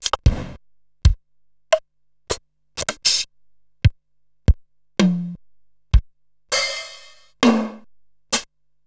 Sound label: Ensoniq SQ-R Plus, Samples Sliced; m8